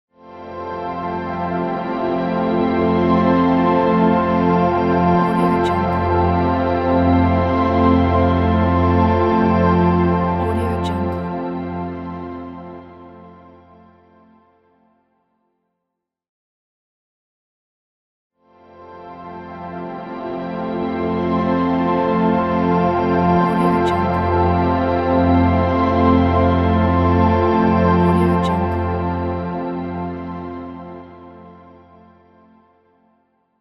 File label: soft opening logo